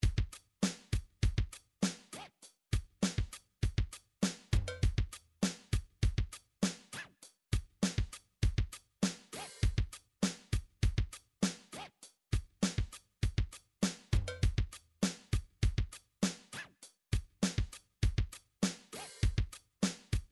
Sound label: midi drums rap